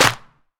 subtle reverb hand clap sound g key, Royality free clap tuned to the G note